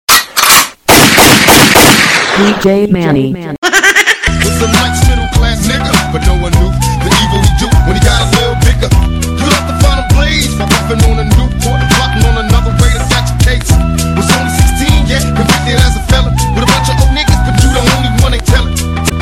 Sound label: Punjabi Gunshot Mix